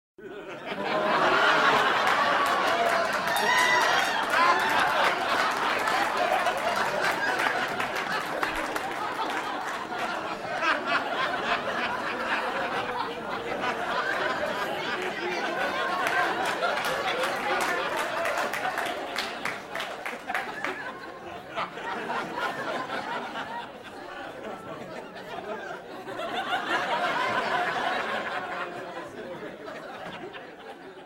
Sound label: Crowd Laughing